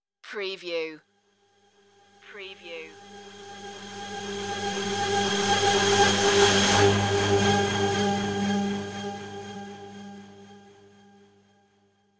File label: shiny gleam revelation